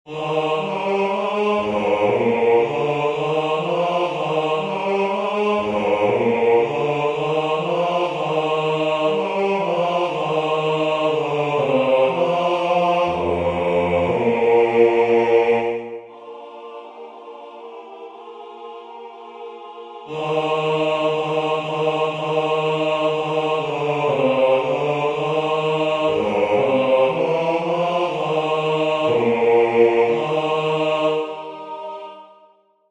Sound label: O Du Frohliche Bas